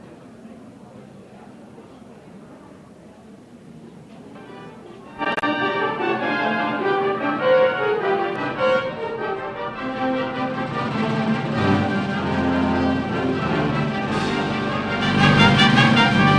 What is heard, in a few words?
Fanfare; Orchestral